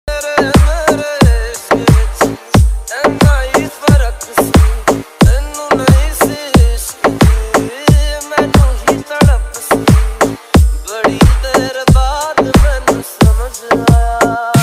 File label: ringtone